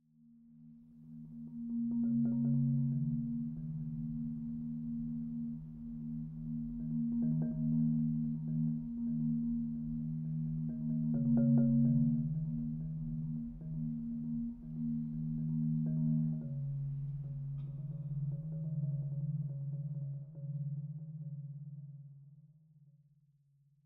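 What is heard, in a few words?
Marimba and Cymbal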